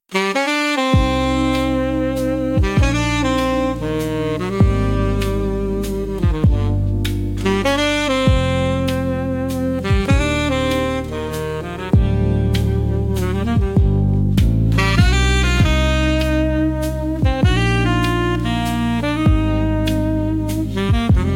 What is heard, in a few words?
golden sax vibes